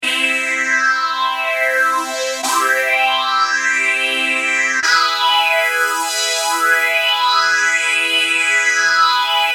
JD990 brass, Mutron Phasor II

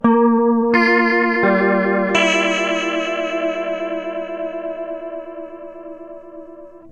clean gtr destination rotation

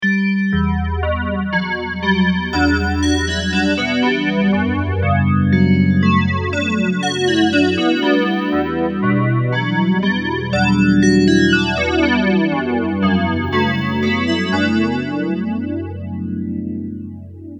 DX7 bells, ADA flanger

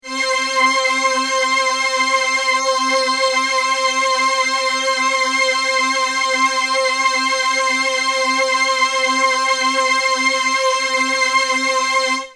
RS09 Next chorus

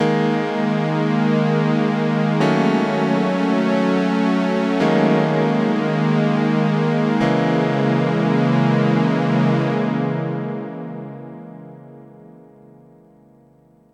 pitch shift doubler

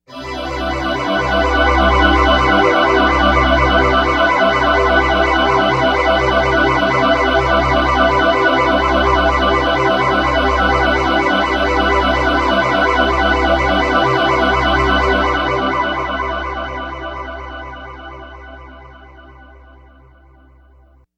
poly pad pt9pro

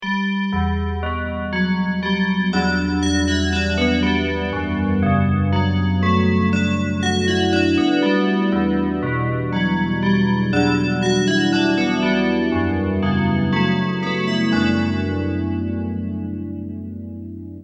DX7 bells, Rockman stereo chorus

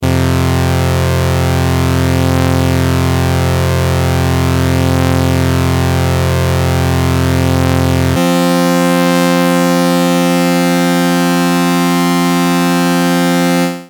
prodigy saw 5ths dry

